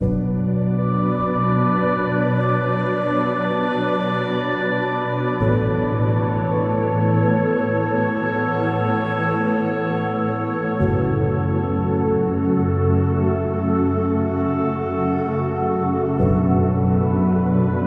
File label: Meditation